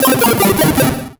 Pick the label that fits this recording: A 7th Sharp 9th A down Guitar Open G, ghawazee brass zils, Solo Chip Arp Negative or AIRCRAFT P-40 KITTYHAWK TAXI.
Solo Chip Arp Negative